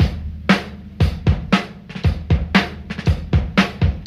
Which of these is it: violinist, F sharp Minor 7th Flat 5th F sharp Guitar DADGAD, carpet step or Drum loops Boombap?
Drum loops Boombap